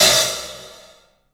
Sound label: PAHAT, 3D Drums, LITE CLUB HT; 3D Sound